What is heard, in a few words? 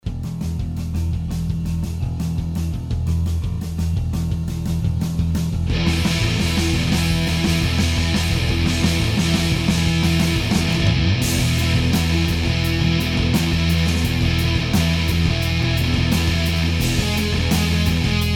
V4 Op-Amp layered LP Strat no comp